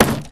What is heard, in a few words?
Crate Break